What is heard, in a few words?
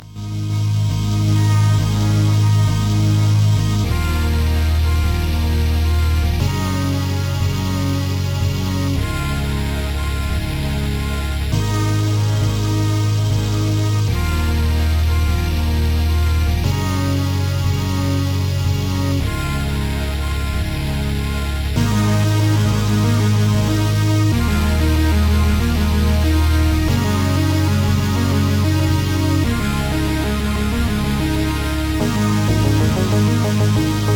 liquid ambience; Fudge; Trackers; Modland